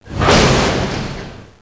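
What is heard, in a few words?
subway door close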